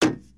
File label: Sad Collapse Head Hit; emotes